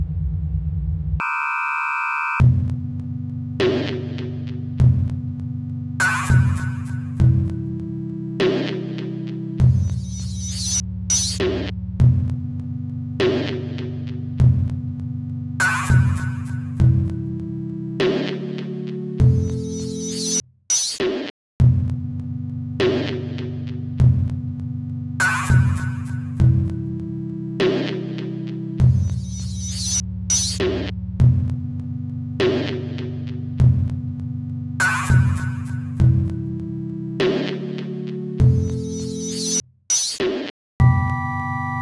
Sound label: Sine Wave sample